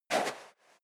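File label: step leather shoes sand single right, footsteps; schritte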